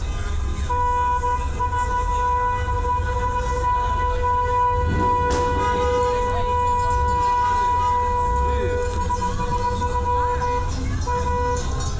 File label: foley